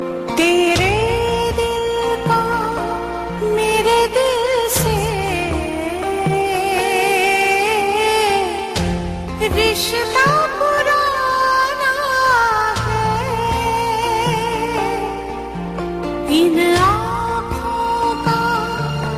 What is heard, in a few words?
Romantic